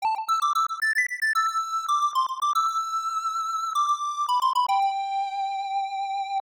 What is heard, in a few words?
Warrior's Flute; dragon slayer the legend of heroes ii genesis